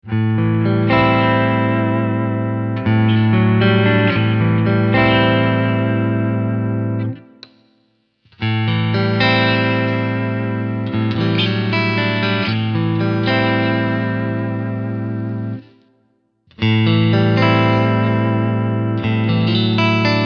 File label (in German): Pickup Fahrt